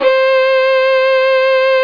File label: violin; fmsx